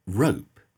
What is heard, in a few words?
rope